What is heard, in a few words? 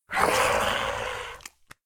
Ghoul Growl; SyrinScape; East Ward